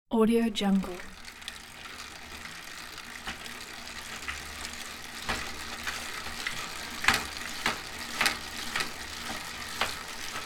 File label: bike pedalling close fast speed; urban sounds